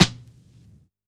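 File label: Vinyl Snare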